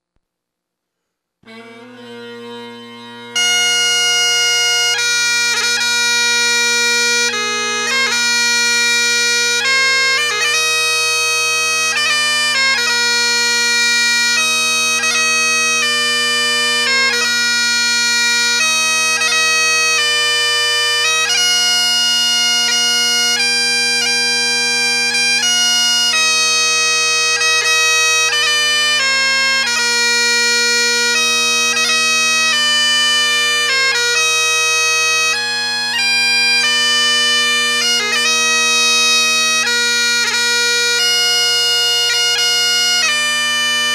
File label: irish wedding song